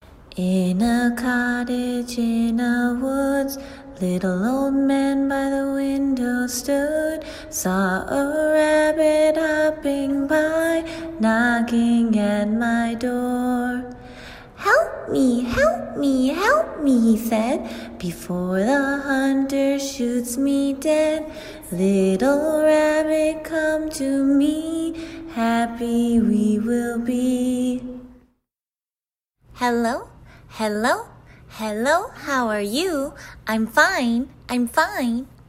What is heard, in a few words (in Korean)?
영어 여 노래